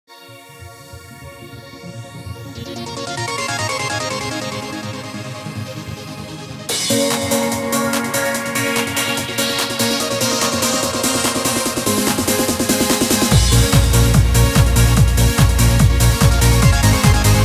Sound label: powder snow trance ver